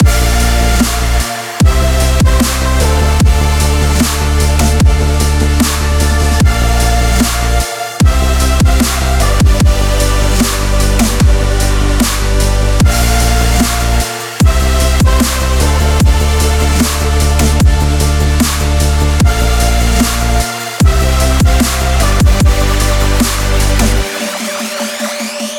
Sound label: intense sporting inspiration loop